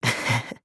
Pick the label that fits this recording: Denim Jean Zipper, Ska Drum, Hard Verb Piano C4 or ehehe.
ehehe